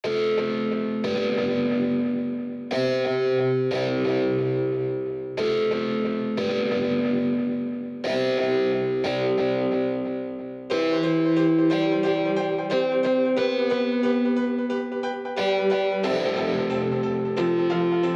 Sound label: joy to the world echo guitar